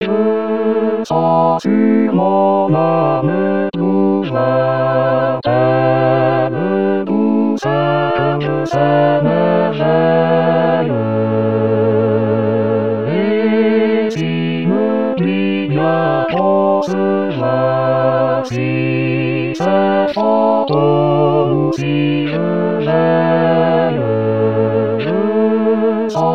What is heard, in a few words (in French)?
basse; rimailleurs